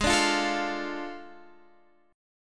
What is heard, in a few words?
A Major 7th Suspended 4th Sharp 5th